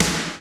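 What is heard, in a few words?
snare combo, drum kit; Rhythm Section; Heart Attack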